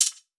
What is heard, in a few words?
Stereo Perc; Percs